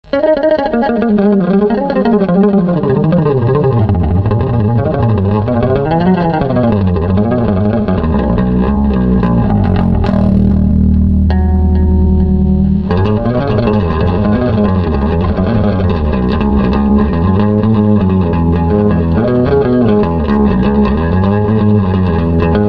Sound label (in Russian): BAS GITARA solo